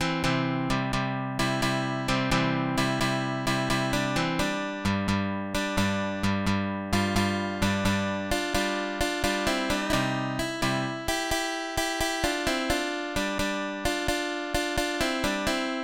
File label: Studien Allegro; Carl Blum